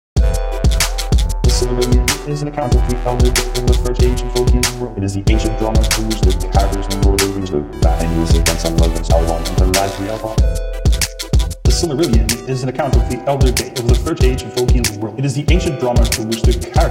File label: Auto Rap